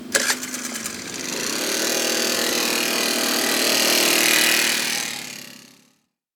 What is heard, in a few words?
Rattling Scooter Fast Drive Off